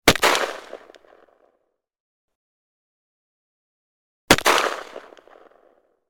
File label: weapon gun savage 10 .300 blackout; still north media